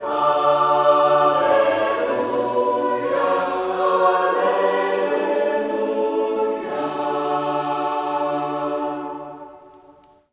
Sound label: Choir Hallelujah